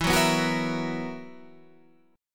B Major 7th Suspended 4th E

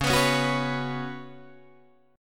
C sharp 7th Suspended 4th C sharp, Guitar Open D

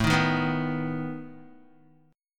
A Suspended 2nd Flat 5th A down Guitar; strummed

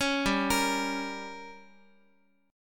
G Diminished, Guitar; arpeggiated; JGuitar

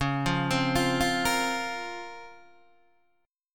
C sharp Major 13th C sharp, Guitar Open D; down; chord shape

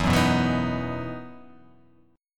E Major 7th Suspended 2nd; EM7sus2 strummed

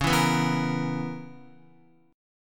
Db Minor 13th Db Guitar; Dbm13 strummed; JGuitar

